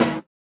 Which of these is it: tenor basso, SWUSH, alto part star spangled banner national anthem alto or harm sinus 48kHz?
SWUSH